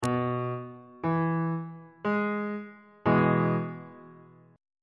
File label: f flat major 2nd inversion